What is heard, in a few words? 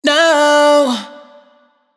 riff no Em wet, Vocal; Slate Digital Omnivox Vocal Sample Pack